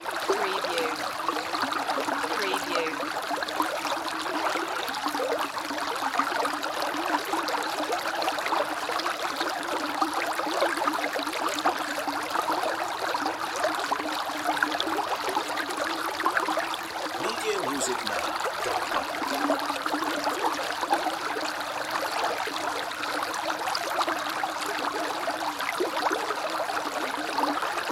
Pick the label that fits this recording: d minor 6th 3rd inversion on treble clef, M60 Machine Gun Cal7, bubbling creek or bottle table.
bubbling creek